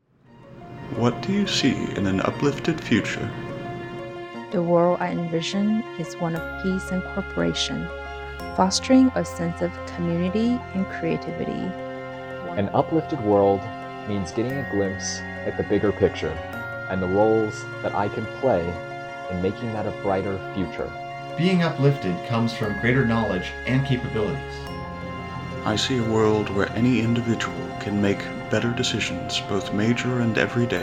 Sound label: music